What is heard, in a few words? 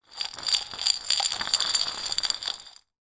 broken glass pieces inside glass jar roll concrete; zapsplat